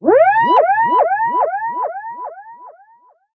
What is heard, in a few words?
vector alert